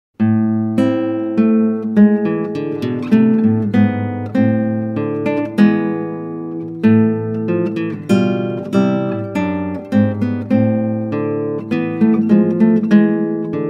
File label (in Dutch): Klassieke Gitaar; Bach 50 Solos